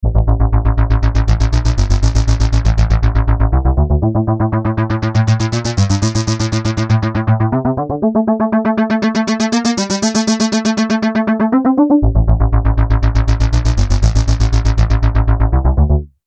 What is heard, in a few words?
synth